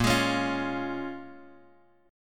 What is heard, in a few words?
A sharp Minor 7th, A sharp, down, Guitar, Drop D